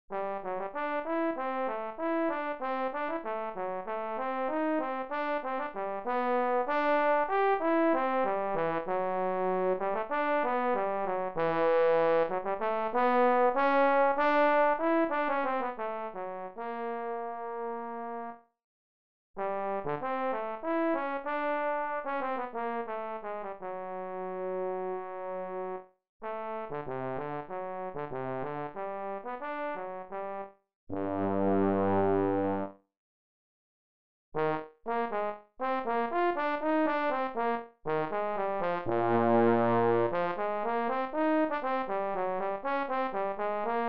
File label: Trombone